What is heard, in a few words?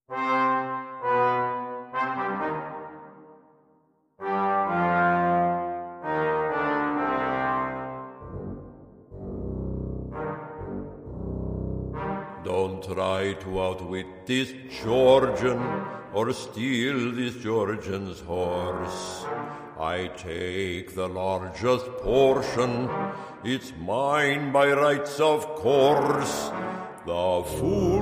BRASS